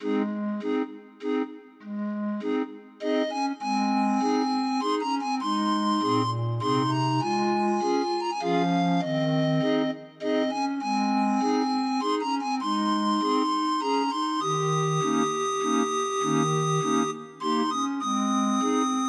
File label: Amazing Grace, 31 note MIDI, Grind Organ; Demo of 31 note MIDI file